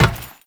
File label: step metal, footsteps; Warframe; Teshin